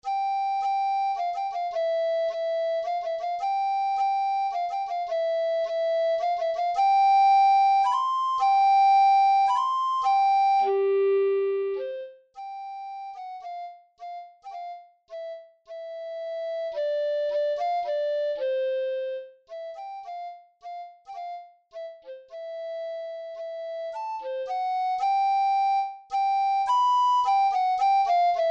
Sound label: minuet; paderewski ignacy jan